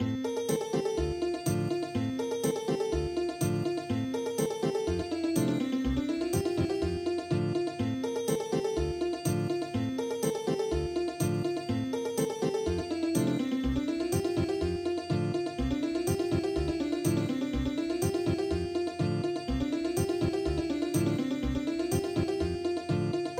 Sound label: Ethnic Guitar; sinsin